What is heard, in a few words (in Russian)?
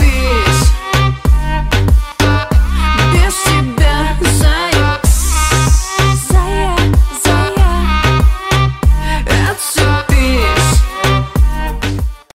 russkaya luchshaya muzyka rington